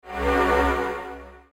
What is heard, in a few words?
sound msg; Nokia; 6270; Series 40